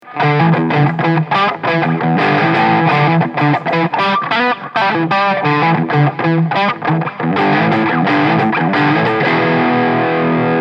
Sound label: Mooer Tube Engine BE OD